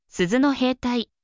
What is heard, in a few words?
bell